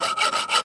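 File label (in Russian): работа острой ручной пилы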